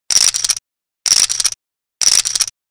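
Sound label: sounds of casino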